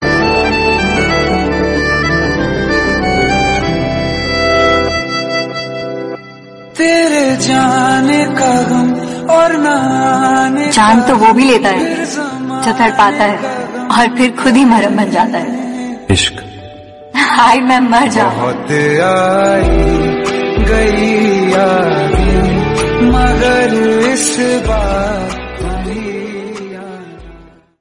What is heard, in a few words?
Sad Version Ringtone; Marjaavaan